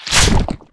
spit hit; weapons

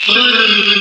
kingpin pain; svencoop

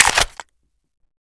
saw boxout; svencoop

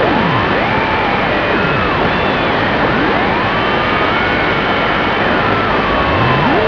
radio static

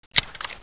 m4a1 silencer off, weapons

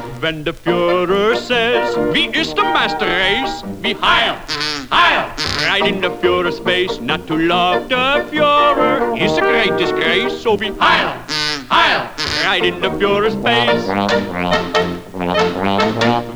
crate tune; dod; greenhorn

